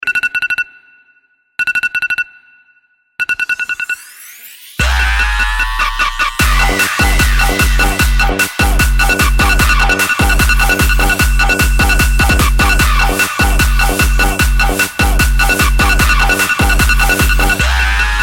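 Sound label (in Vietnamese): tiếng báo thức rồi nhảy đi nào; tiktok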